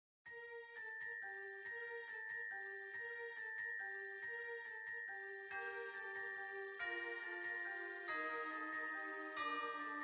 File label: Ukrainian Bell Carol